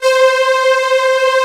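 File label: M12 strings C3; VINT.KEYS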